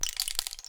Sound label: Rain Stick, Percussion Samples